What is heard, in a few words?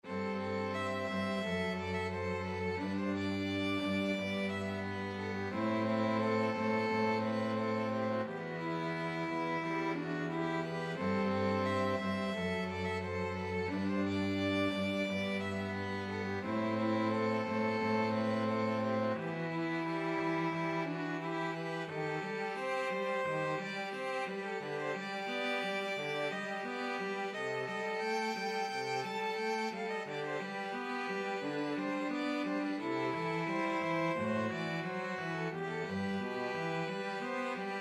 borodin polovtsian, 2 violins cello